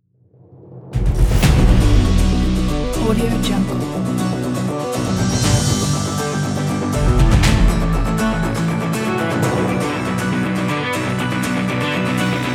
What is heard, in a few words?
aggressive metal apocalypse teaser trailer